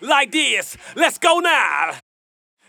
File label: VOX